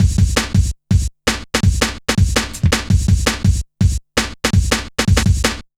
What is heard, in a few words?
Drum Loops, Jungle